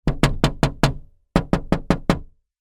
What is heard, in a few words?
household door knocks thin glass wood multiple; zapsplat